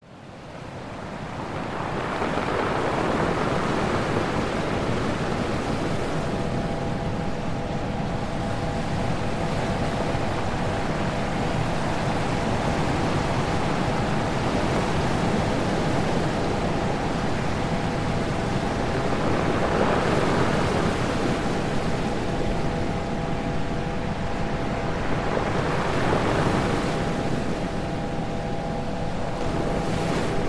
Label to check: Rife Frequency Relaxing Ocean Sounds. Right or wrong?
Right